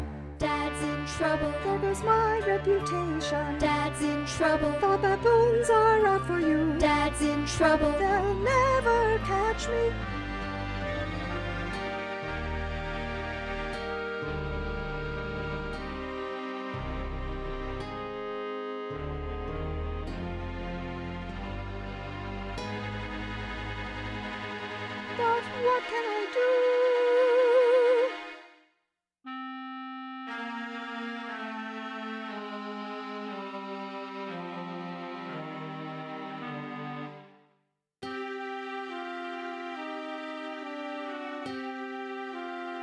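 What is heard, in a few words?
Accompaniment; Hear what will be played when you audition; 2014 Rain Dance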